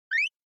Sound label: Motion Slide High; スライド